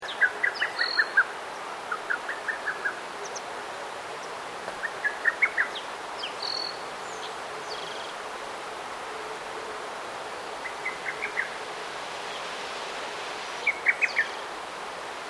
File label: AMBIENCE PEONY GARDEN BIRDS WIND, Japanese garden Ambience; Loop; Outdoors